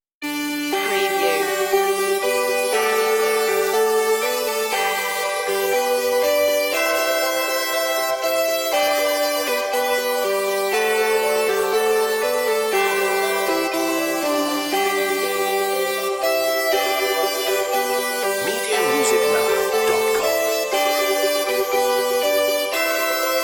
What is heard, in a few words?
auld lang syne synthified light